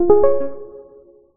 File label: Jig; Nintendo Switch Sound Effects